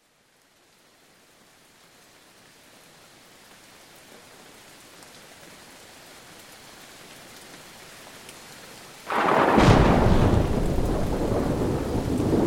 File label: Fall Rain